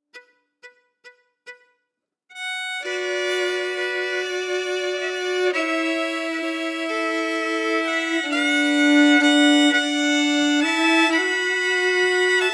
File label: Leaving Lochboisdale harmonies emphasized